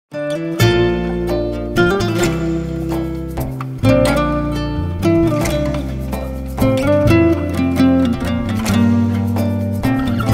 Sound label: gitar cover